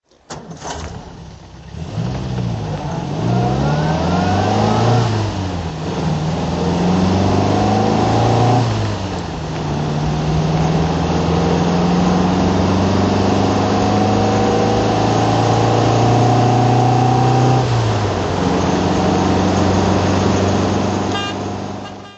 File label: mga drive